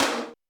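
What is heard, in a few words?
Tom Hi Aggressive, Alesis D4 drum machine; 300 Drum Machines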